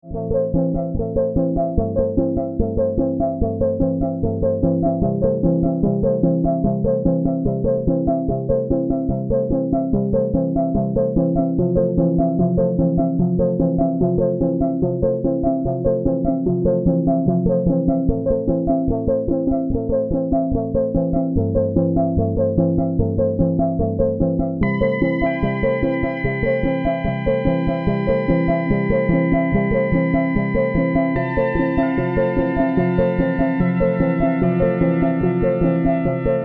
moog polymoog

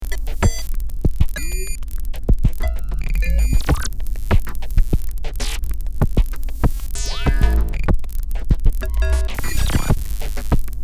serge modular

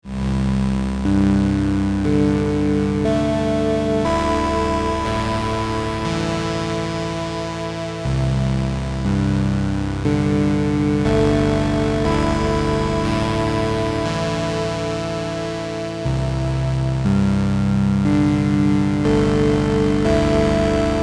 PPG wave

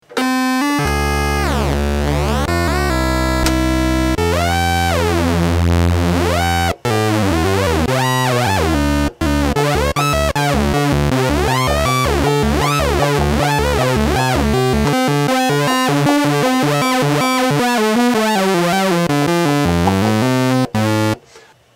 moog realistic concertmate mg 1

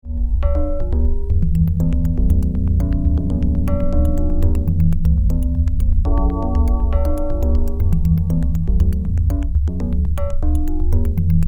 yamaha cx5m

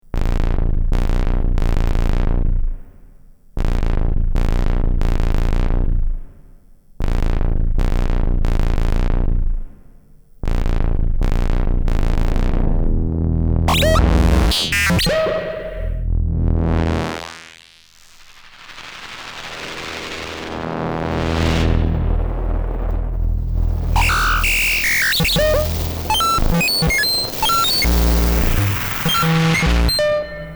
buchla 100

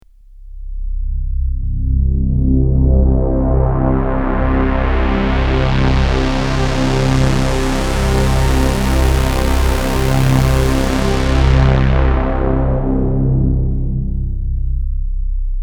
korg trident

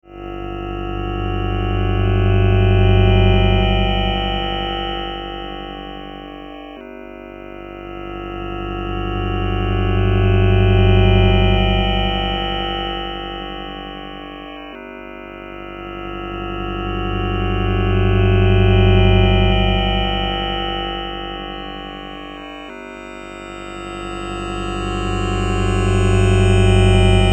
fairlight cmi

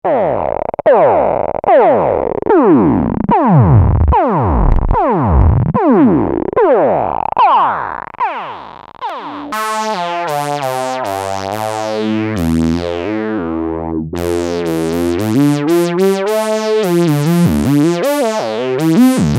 korg ms 20

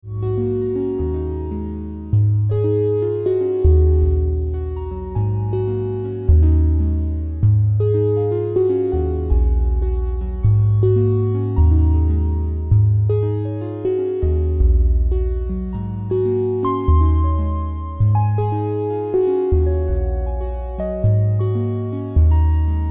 yamaha cs70m

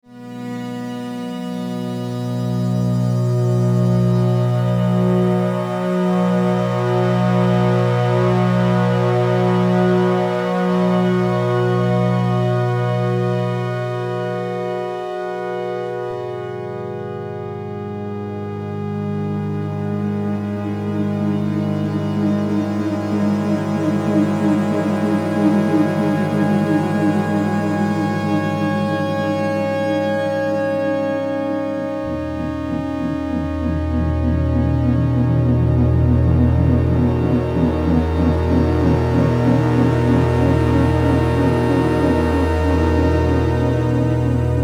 oberheim sem

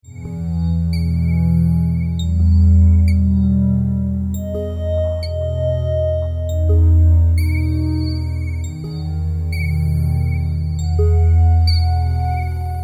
roland 100m

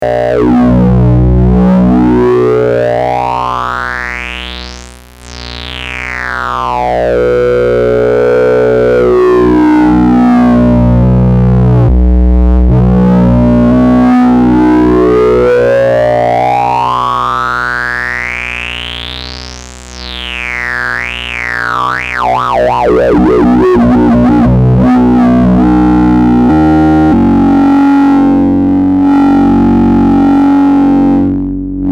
yamaha cs 5

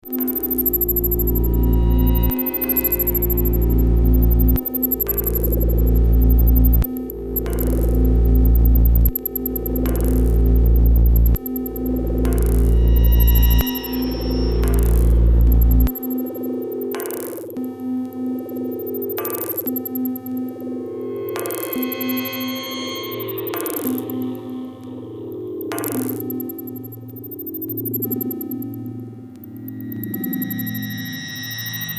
ems vcs3

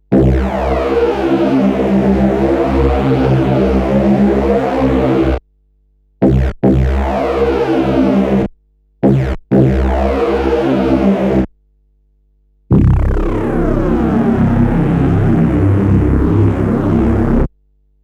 korg ds8

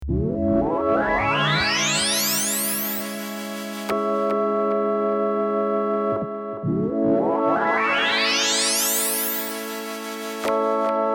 sequential circuits sixtrak

